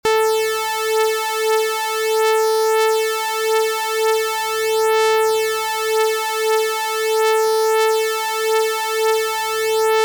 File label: saw Flanger; FSound